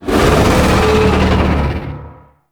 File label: Void Dragon Vocal Pain; Enemies; Vocals